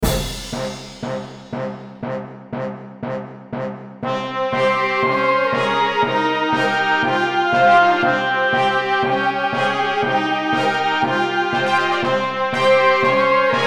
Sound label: Battle; edgar